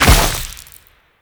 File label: Infested Bone Blade Mortar Fire; Warframe